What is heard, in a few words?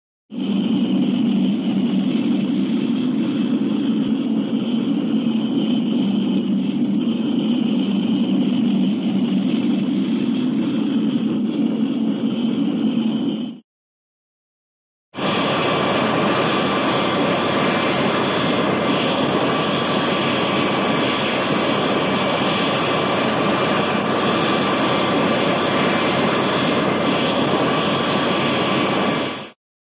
airplane, jet interior in flight; cartoon